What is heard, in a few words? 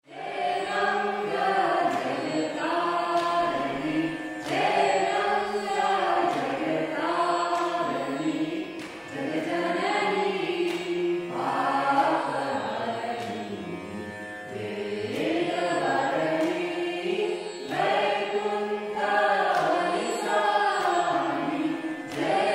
Bandish raag Durga; Jai Ganga